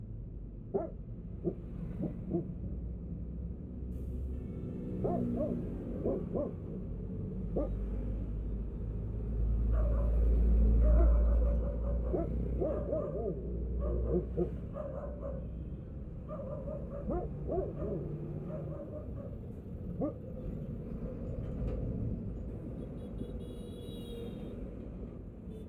Street noise and barking after passive noise reduction